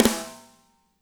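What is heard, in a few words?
snare left; Spectrasonics; Burning Grooves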